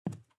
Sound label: run wood; gamerip; Out of Reach: Treasure Royale